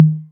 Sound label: 808 Tom Mid; Novation DrumstationV1